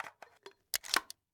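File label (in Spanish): COLOCAR LASER CALABERA, place laser skull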